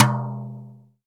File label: TOM, Drum Dry; Drum 1 Dry; East Collexion